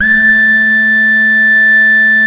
beginloop, synth; Keith Emerson Signature Series; BEGINNING

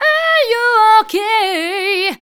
female dance vocals

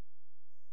C#, Synclav FM, FX; Big Fish Audio

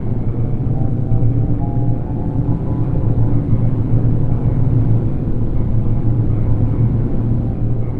Drone Ambient; Drums & Drones

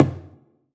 HAIRDRUM, AFRO PERC; EdgeSounds; Drum Mashines VOL-1

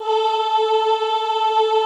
OHH A3, Classical Choir; Best Service; Extended Classical Choir

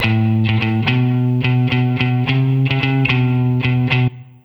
108 Am Guitar Riff Thing, Melodic Loops; Electronisounds

